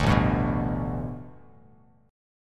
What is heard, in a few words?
A 9th Suspended 4th Bass Standard, chord; A9sus4; strummed; JGuitar